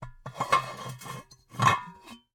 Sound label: Cookie Jar, ceramic, glazed, irregular shape, hollow base, hollow top, rough, resonant, tonal, adjust; remove; Eiravaein Works; Vaeyan IV